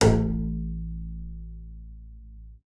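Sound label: bass col legno A1; Xsample VOL-5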